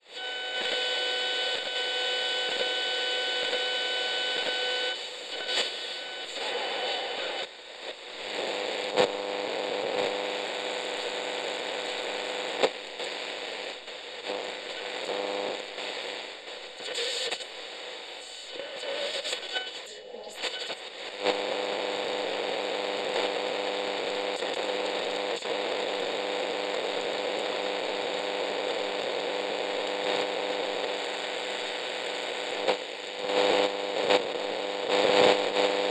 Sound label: technology radio static interference tuning long